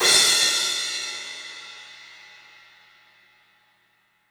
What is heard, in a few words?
CYMBALS; 3D Sound; 3D Drums